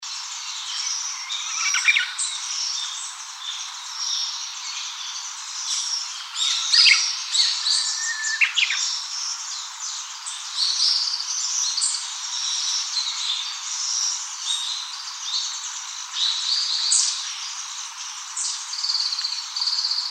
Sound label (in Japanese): uguisu